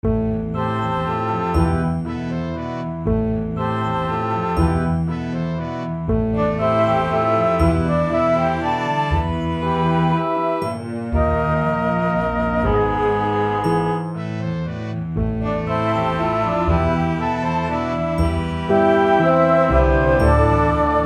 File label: serious show tune